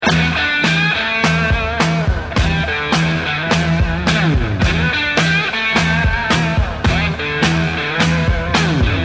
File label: riff, Octavia